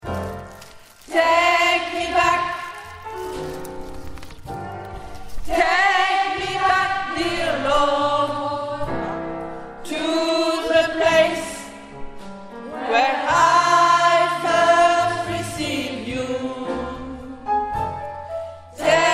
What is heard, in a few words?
alto